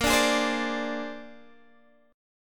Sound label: Bb 9th Bb down Guitar Open G